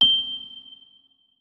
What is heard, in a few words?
notes, keyboard, electric piano